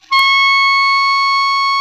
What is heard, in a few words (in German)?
KLARINET D#4, CLARINET